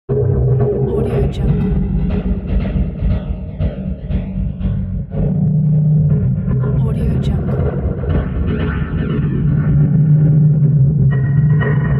black mysterious atmosphere

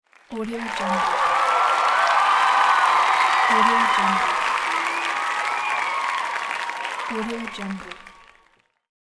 crowd cheers

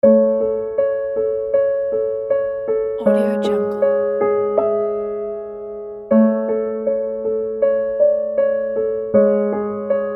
sad piano; phillarson

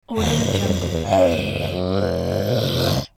hoarse and throaty growls from monster; sound ideas

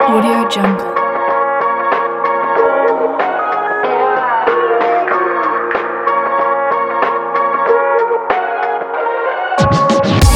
the triphop; u-music

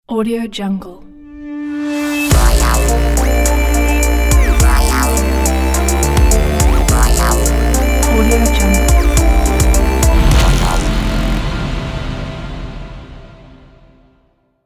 innovative short logo

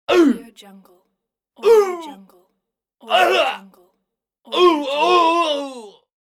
pain shouts

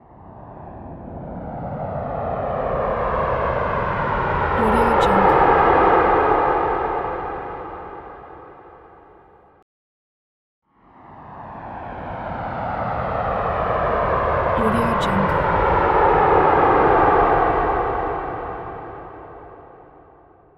airplane spacecrafts

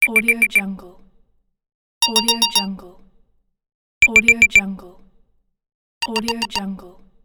warning

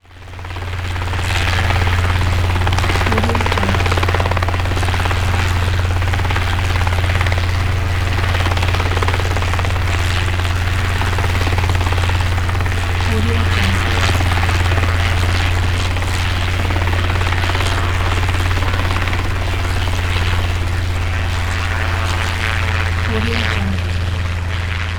helicopter away

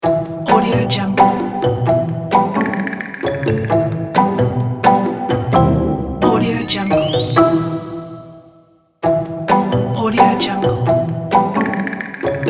comedy logo

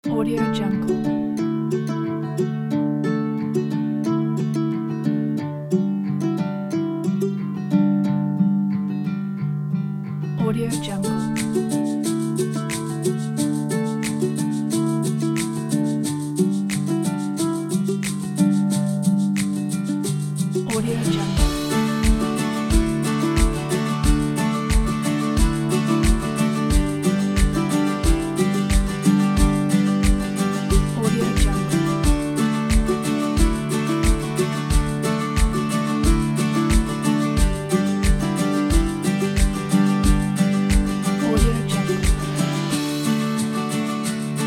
upbeat happy and inspiring

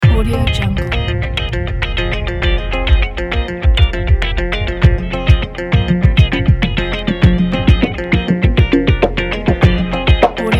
funky hip hop

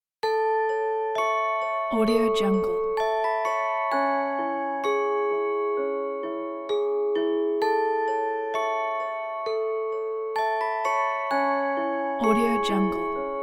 lullaby

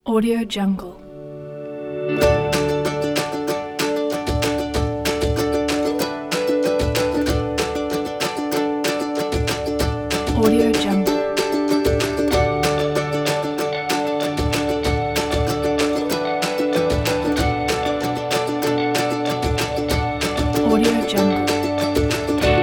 upbeat uplifting acoustic indie folk

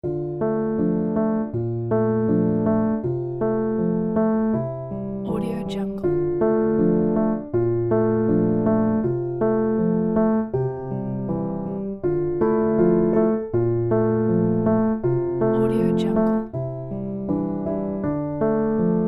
calming thoughtful piano

